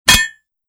bullet hit tin; Shattered Horizon

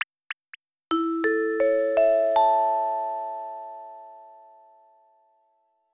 Jingle, Notice; Nintendo Countdown Calendar - Event (Notice 1); Nintendo DSi

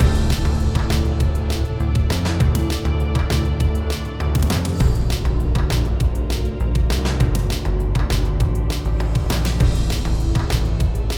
music boss; Robbie Swifthand and the Orb of Mysteries; PS4

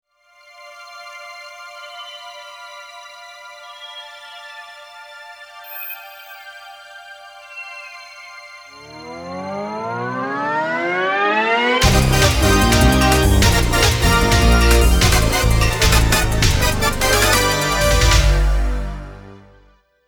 Stage Start; Mega Man X3; Saturn; Crush Crawfish

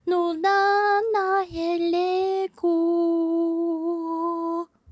Miner sing

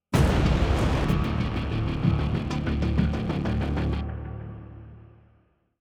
190bpm; Legends of Pegasus; windows gamerip 2012

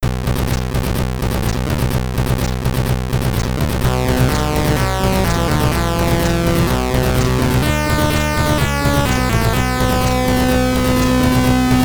Character Selection (SID Stereo); Xenophobe; Commodore 64

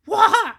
rage

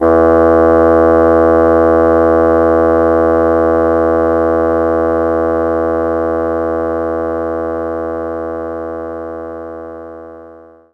Bassoon E2; knights try

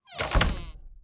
wood door

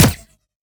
Sciweap Shot Small Gun Bolt Colt

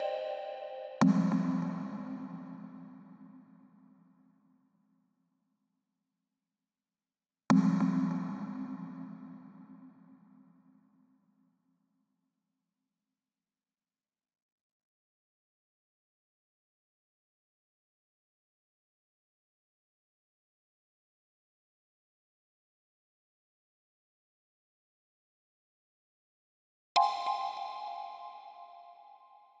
Breakout Frantic Mix Stem Layer; Breakout Recharged

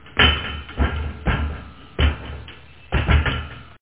metal walk; Five Nights at Freddy's; FNAF 2

